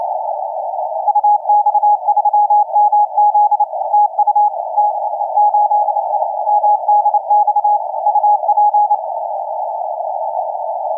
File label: 12m